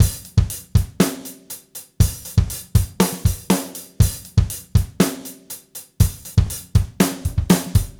Gretsch groove 90 BPM, 1967 Gretsch Kit drums

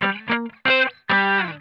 GLIDE, Funk Guitar; Zero G - Funk Guitar

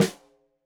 Snare Stereo, Drums; Deeply Sampled Drums

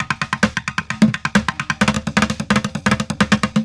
Street Groove, Ambient Grooves

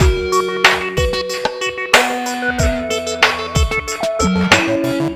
Beats, Synchro Funk

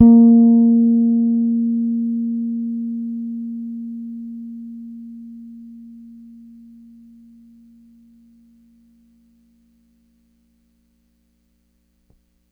Ds A#4 hard, Bass, Pedulla Thunderbass; Giga Samples Collection

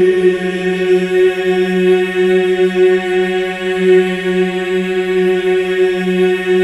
F#4, Symphony of Voices men; MEN EES OCT

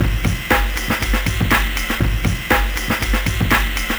Amen, Breaks

